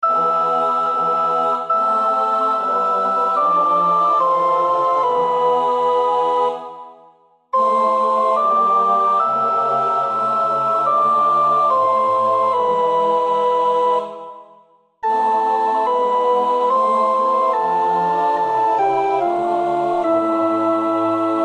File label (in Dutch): sopraan, koorpartij oefening; Bach (Johann Sebastiaan); BWV 245 Johannes Passion